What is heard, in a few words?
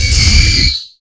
alert, ghost